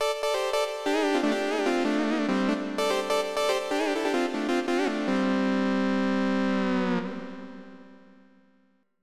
saw; Roland - An Invitation To The Roland World Of Music

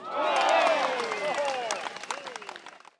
juggler; PHARAOH

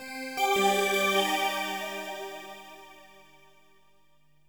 synth pad ambient 160 C; Sphere; eJay

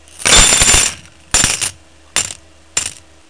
cash; The Fishin' Hole v1.0

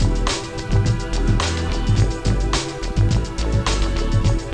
Smooth Loop; MacAddict

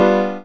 piano G7; CLARITY

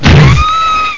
squeek; carma

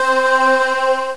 fan vo c5; Star Trek demo; Star Trek Audio

